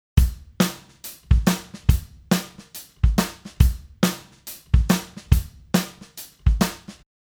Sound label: drum off